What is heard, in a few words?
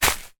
foley footstep grass single